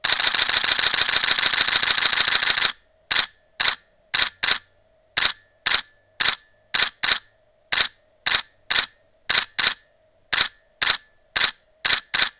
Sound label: D300s continuous H RAW; Nikon